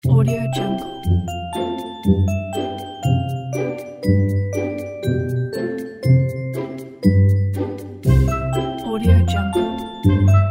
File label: fun spring weather